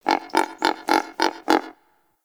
Forest Creature Mid Medium; Warframe; Grineer

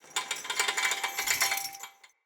Cage Rattle, Hanging Cages

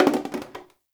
Janitor Cart Plastic Bottle Drop; Ambience; Gameplay; Warframe; Nineteen Ninety Nine

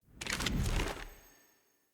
UI Default Map Open

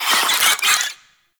Infested Ashen Vocal Attack; Warframe

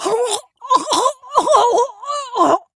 Death; Warframe; Corpus Lich; Persona B

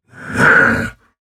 Attack Effort; Warframe; Dax Heavy; Battle Barks

